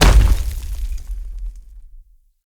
Infested Femur Step Default

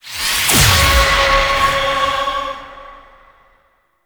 Choir Chorus Cast Life

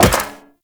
Wall Jump Metal Perforated; Footsteps; WallRun; Warframe; Characters; Tenno

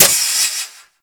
Heat Gun Mech; Warframe; Grineer

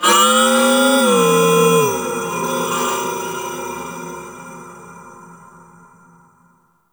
Temple Moving Bells; Corpus Ship Remaster; Temple One

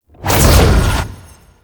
Teleport Jump; Enemies; Abilities; Warframe; Tau; Dax Ninja

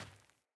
Sandals Run Dirt, Footsteps; Player

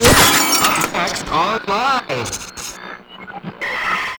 Screen Blast; Warframe; Ambience; NineteenNinetyNine

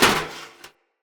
Battle Dog Helmet Destroy Chunk; Snc Battle Dog; Foley

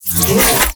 Briefcase Scythe Unequip; Warframe; Weapons; Corpus

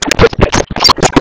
Pulse Cast; Warframe; Gyre

